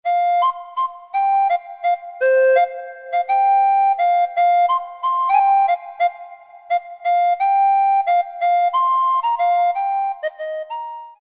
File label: Recorder, Roland MT-32